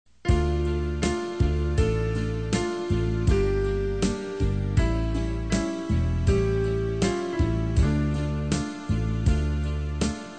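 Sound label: soprano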